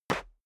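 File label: step dress boots concrete slide short, footsteps; schritte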